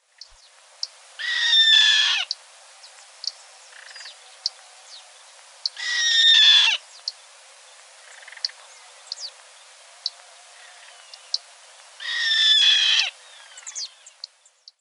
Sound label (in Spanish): chimango, aves